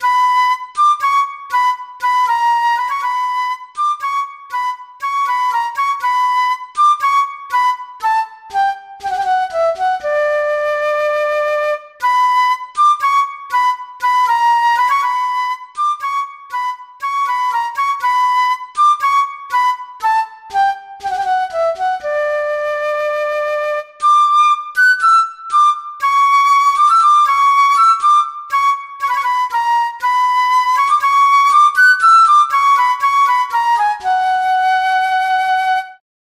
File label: Flute Legato; Woodwinds; Syntheway